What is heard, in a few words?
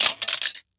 Technology Electronic CD Case Open Fall To Ground